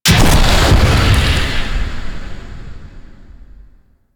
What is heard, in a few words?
Void Dragon Firebreath Cast Ice; Enemies; Abilities; Warframe; Duviri